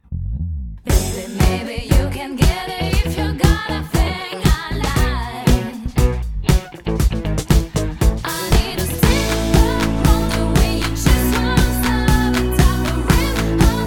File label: Sax